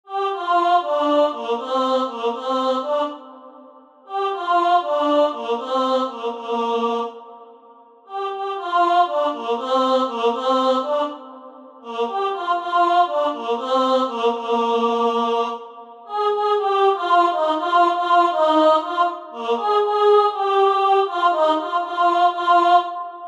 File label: Sopran, Midi; germersheim; Weihnachten